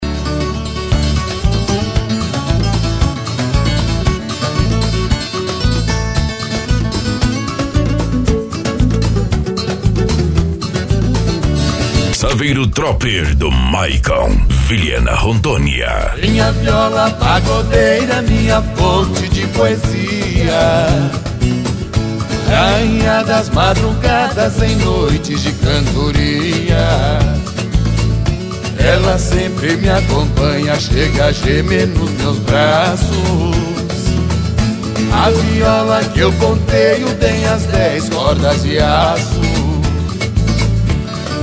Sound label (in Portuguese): pagode de viola